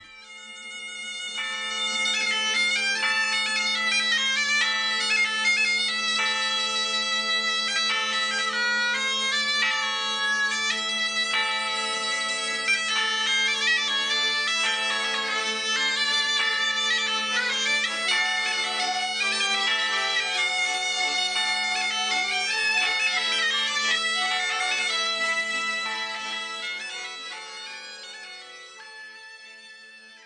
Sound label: cabrette